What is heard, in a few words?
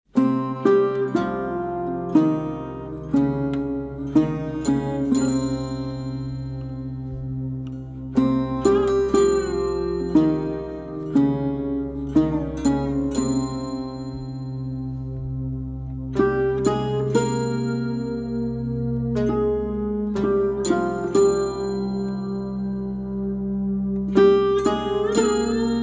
(Instrumental); BANGLA; Nobel Chor (2012)